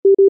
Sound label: short double low; iOS System Sounds